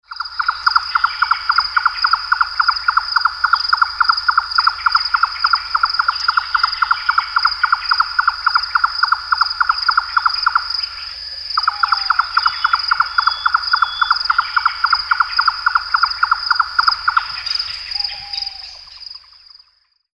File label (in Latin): Caprimulgus ruficollis